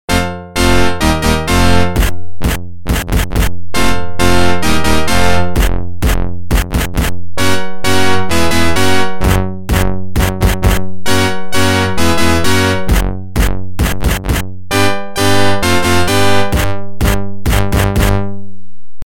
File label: Organ; nhl 94 organ music